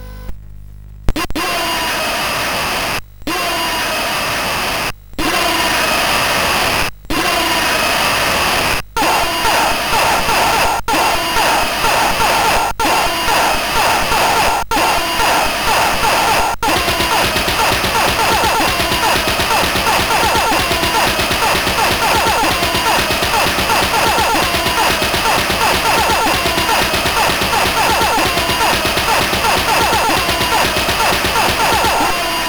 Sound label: Sheeps in Space II, CSG8580R5